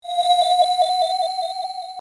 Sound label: spinner spin; Kill La Kill